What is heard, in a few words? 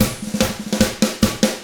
rock drums